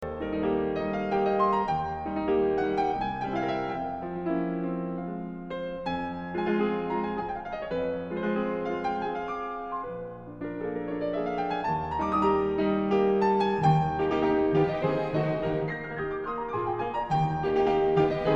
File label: Andante Spianato and Grande Polonaise in E Major